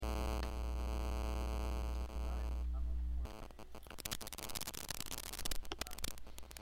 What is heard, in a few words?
interference